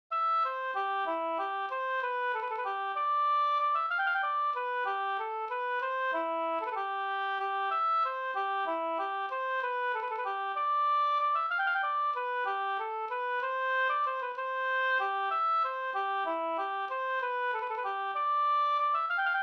vals C dur

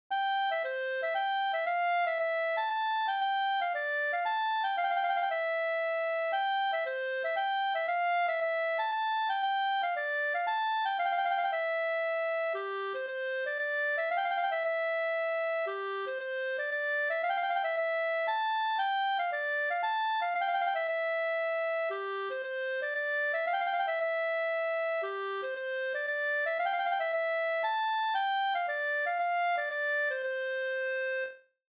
polska Bodapolska

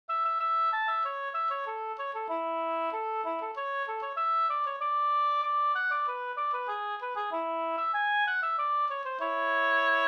ganglat; Nyforsa ganglat av H Andersson

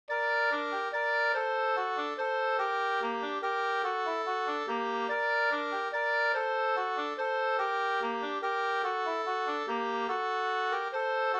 langdans; fr Sd Lilla vallpiga Rosenberg 2st

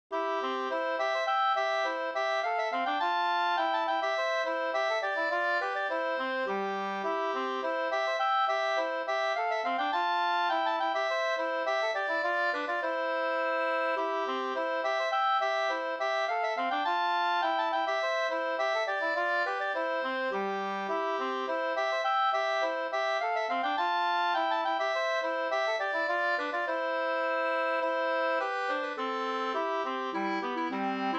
polska, 2st; Dien Anders Lundin Alvdalen

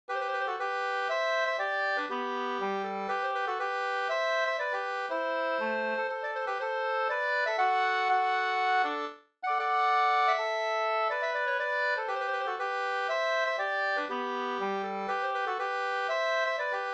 polska Bodapolska

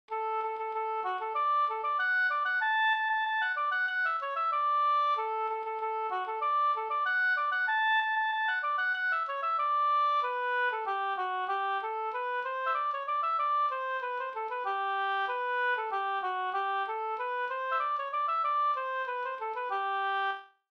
anglas

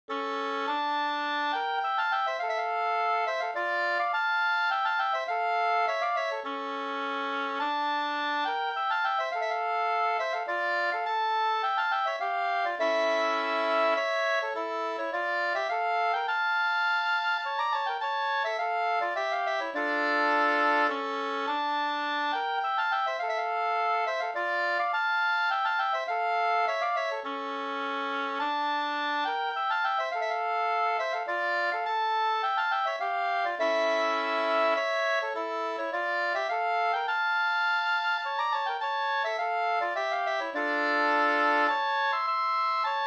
polska, 2st; e Hol Anders Andersson Rattvik

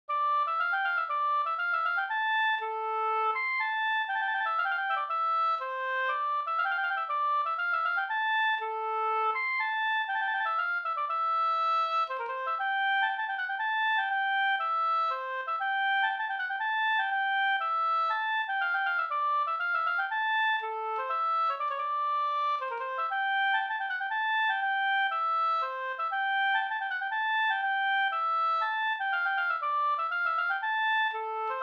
polska Bodapolska

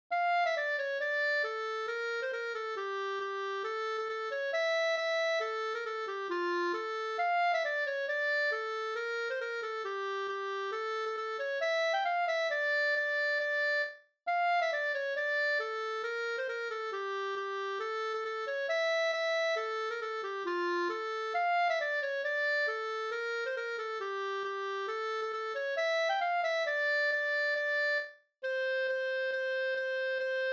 schottis

